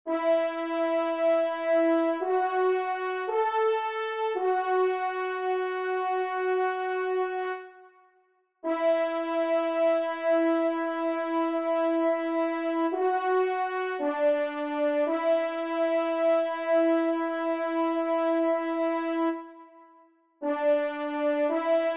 TRP; L'Etang de Saint Bonnet; J Poncet

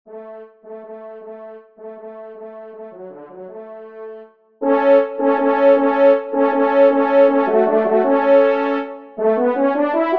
trompe; La Bourguignonne; Sombrun; 3e Trompe

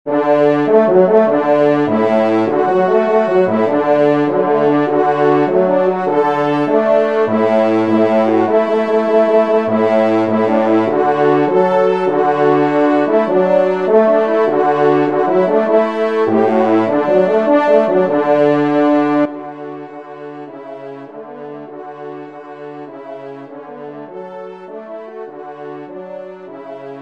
Sortie, trompe; Devert; Sortie 1e Messe

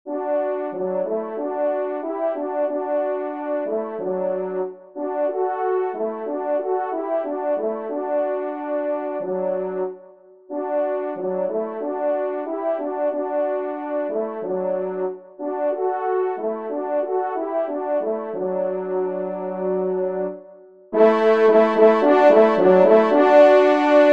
trompe; Un Souvenir

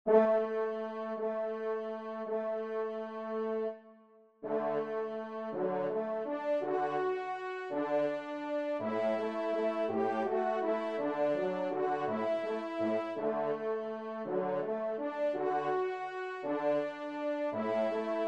Valse; Emile Rolle

